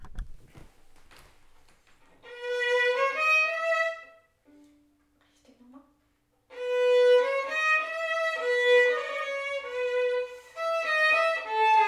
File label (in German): solo Haendel E dur, Violine solo